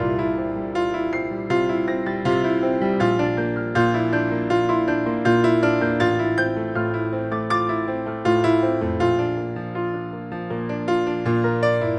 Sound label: Delicate Piano